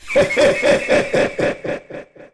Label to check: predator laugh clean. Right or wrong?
Right